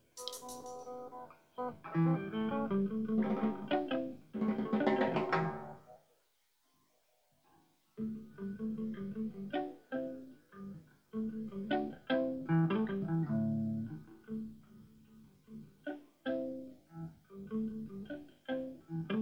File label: Guitar Soundcheck